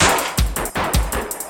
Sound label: LOOP L, Drum & Bass 160 BPM